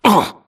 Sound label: grunt hits; Titanfall 2